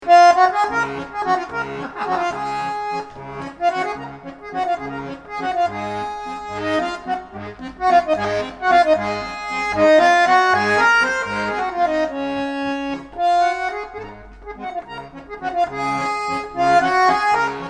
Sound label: valse